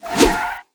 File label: quick Swipe; netent